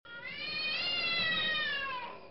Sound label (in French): Chat, Animaux; bruitage